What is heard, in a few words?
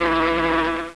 Mad Bee; Koji the Frog 2.0.1